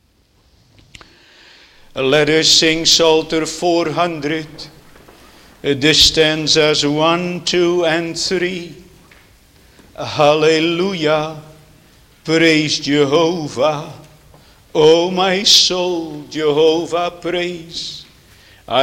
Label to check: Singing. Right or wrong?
Right